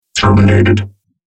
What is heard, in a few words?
death